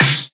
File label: SNARE, Volca; STYLE KITS (Hip Hop, Etc); WallsVado Volca Sample Pack